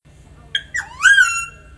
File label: palm cockatoo